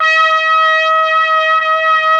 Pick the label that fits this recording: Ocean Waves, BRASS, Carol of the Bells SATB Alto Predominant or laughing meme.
BRASS